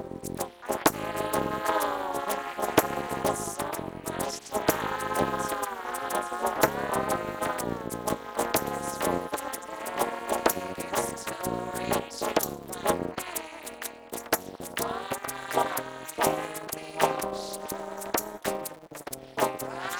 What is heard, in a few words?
percussive